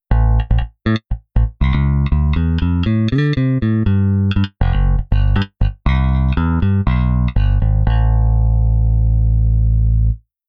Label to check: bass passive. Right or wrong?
Right